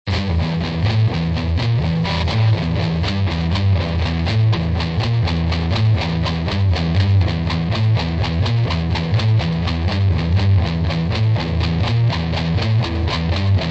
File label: palm mute, egitarre